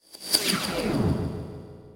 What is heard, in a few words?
Scifi Production Element - Corridor Sweep